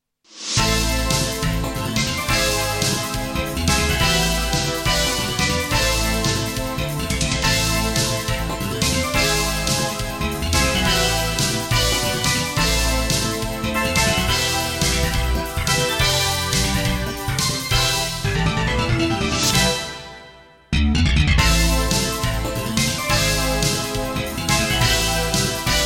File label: Music; Theron's Quest; PC Engine